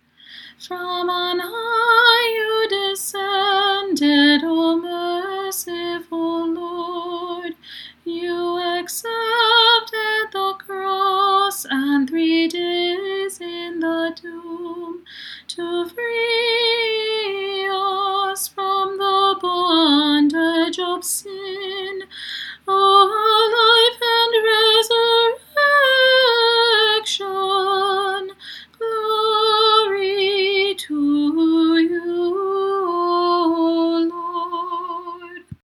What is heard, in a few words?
Apolitikion Tone 8 English; Ex Ipsous